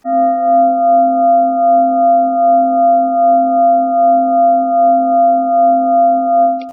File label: singing Bowl looped